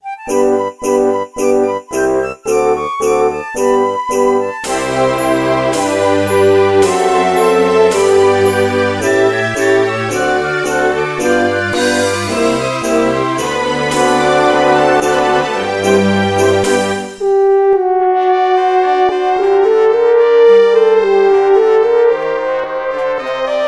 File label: march loop